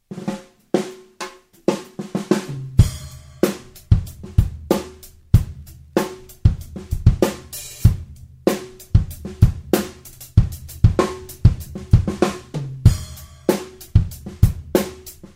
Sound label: drums sound checking in the studio